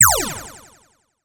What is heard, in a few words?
science fiction laser; sound effects one